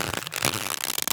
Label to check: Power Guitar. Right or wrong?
Wrong